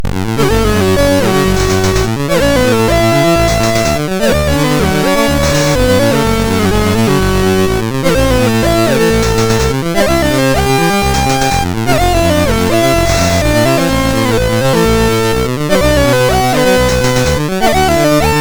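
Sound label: Penetrator, MOS 6581 SID chip music, games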